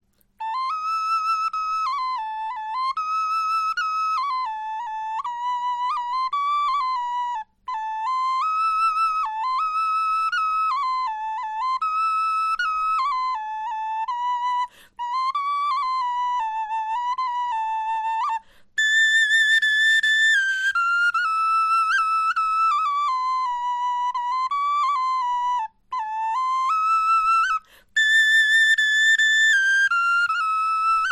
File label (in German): melodie